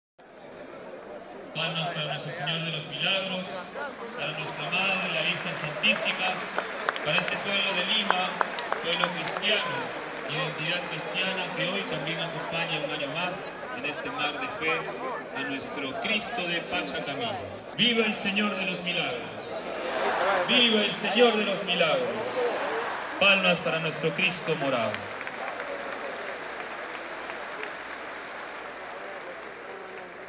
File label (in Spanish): PROCESIÓN DEL SEÑOR DE LOS MILAGROS VIVAS Y APLAUSOS; caminata; fiesta; Gente; pasos